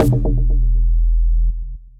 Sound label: Garage Crisp, Percussive; Ableton; Skitter and Step